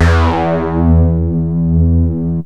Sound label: cyber bass, yamaha rm1x synth bass and lead